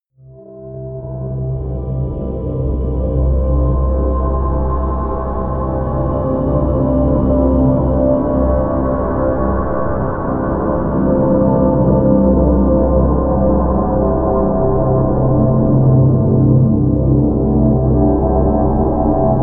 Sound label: drone deep wash